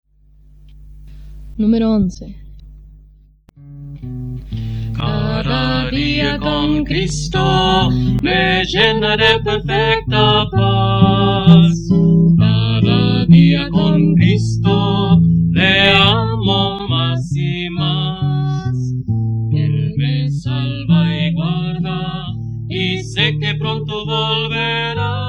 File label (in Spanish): Coro, himnario niños